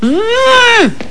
bbzzz

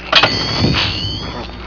CHARGE

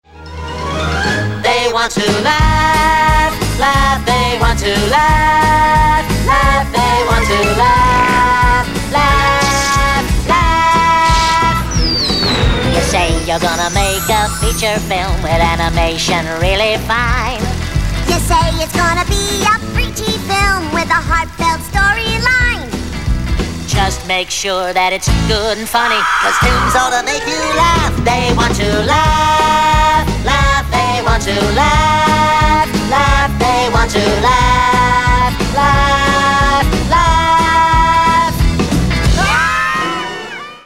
Laugh; Animaniacs

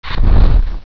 blast; The Scholars of The DreamCity

